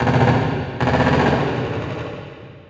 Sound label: inside battle, prison; Half Life Model Pack; Half Life 2; ambient levels